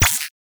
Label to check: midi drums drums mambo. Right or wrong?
Wrong